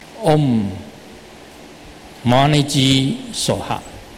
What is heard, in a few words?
marici heart mantra